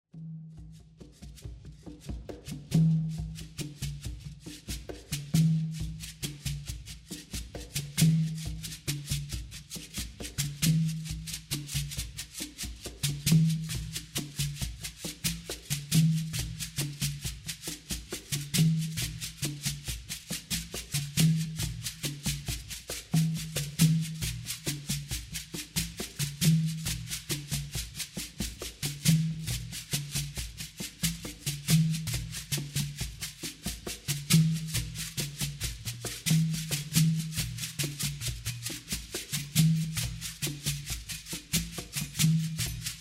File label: Guiro; trancedance